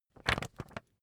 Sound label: magazine skim